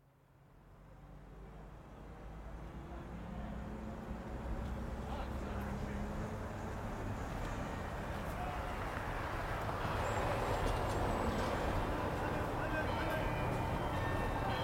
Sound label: Lullaby